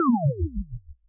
player lost; sfx; CultManor

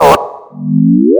railgun shot; sfx; weapon; ScrapFrontier

